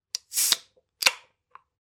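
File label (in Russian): открывание с резким выходом воздуха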